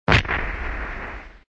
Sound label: shot